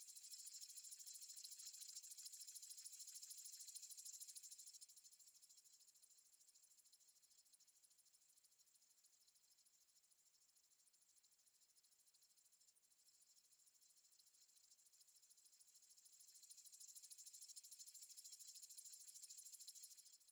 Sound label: goldroom amb